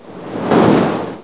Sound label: wind push; Hexen2